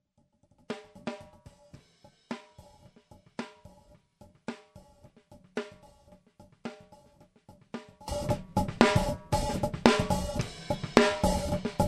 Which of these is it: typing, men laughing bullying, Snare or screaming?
Snare